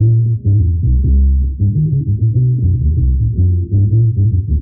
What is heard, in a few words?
bass